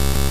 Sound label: Error Sound